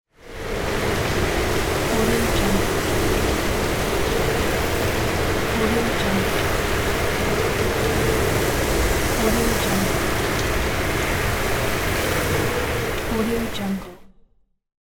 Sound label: strong rain and wind